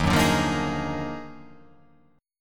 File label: Eb Minor Major 11th; EbmM11; strummed; JGuitar